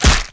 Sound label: FLESH; svencoop; aliens drone